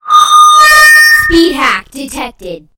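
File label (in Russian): скрипучий звук перед голосовым сообщением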